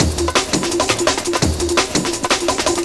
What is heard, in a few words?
Tear Apache, Breaks, Jungle; Blu Mar Ten - Jungle Jungle - 1989 to 1999 Samplepack